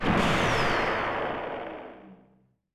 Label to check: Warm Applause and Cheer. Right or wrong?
Wrong